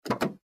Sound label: Car Trunk Open